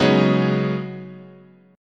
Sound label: C sharp 9th Suspended 4th, chord